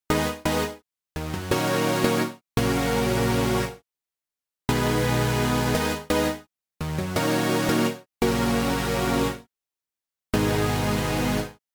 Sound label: Chords Aggressive